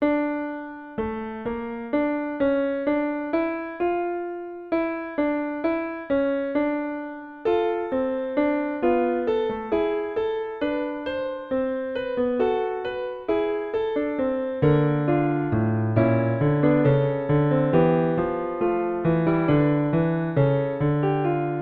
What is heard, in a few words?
fugue